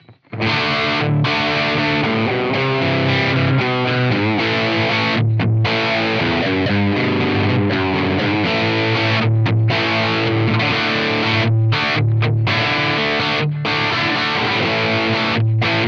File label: Blackstar Polar 2 Crunch Enhance Modus